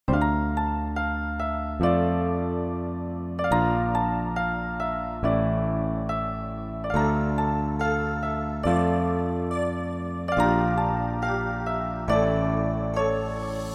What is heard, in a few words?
music sample piano melody